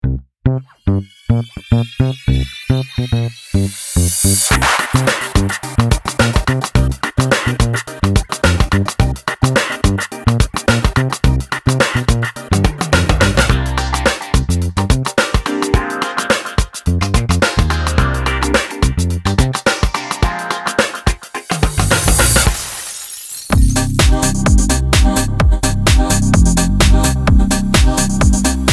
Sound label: Electro Pop; Pulp Music Co